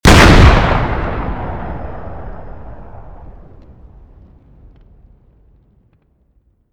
explosion; swb; explosives

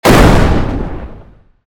explosion short tail; micromayhem

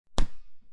ball bounce; punt

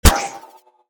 prop spawn; dlfortwars

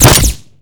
shotgun silenced fire; swb; attachments; silencer

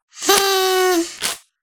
party blower; taunt; terrytarget

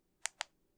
click, pager; micromayhem

